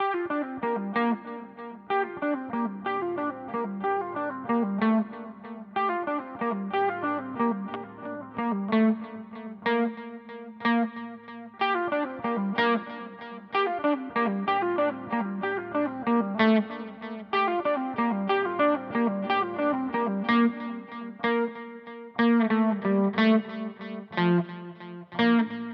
Harley Benton DNAfx GiT Ping Pong Delay

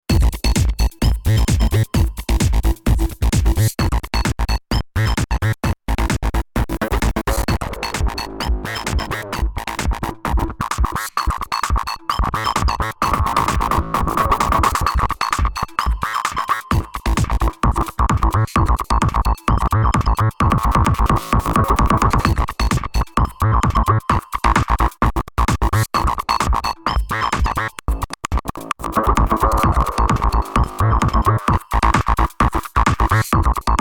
Restyler AM

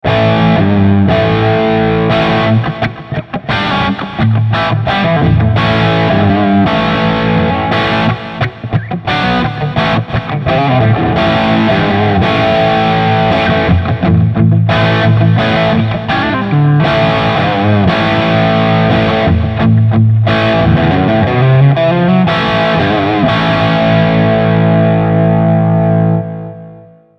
Classic Crunch

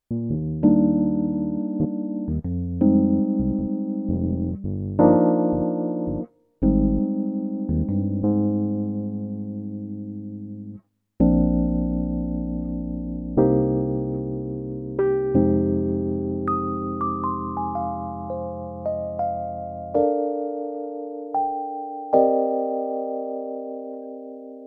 E Piano; Korg B2

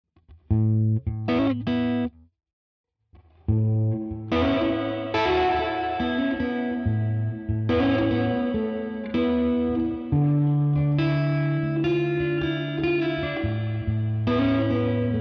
UAD DelVerb Spring

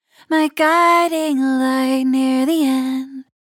Vocals Dist Master Enhancer; COLDFIRE

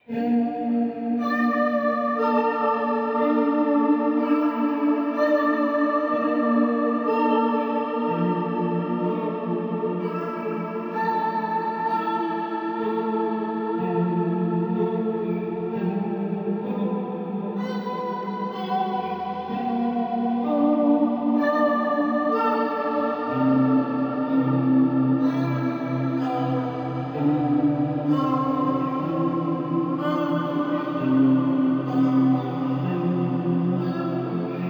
Mooer R7 X2 Warp

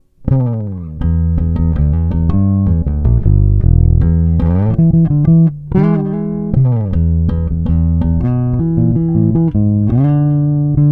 Neck coil

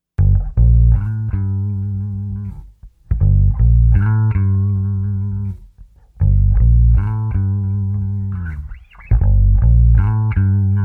Funk Bass Loop

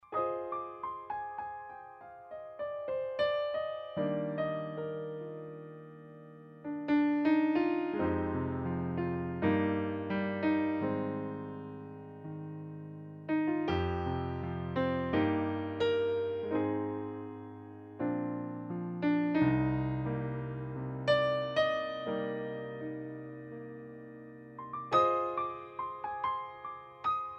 P255 Piano

